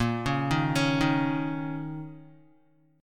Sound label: A sharp Minor Double Flat 5th, down, Guitar; arpeggiated; 4F,4A,5C,5G,5C,6E; JGuitar